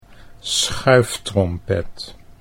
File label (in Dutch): schuiftrompet